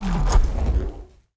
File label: whoosh